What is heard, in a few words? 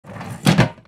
Tactical gear box close